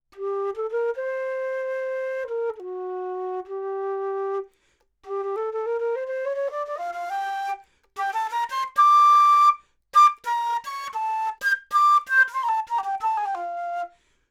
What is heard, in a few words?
Flute, 30cm C